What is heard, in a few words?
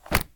closing a dictionary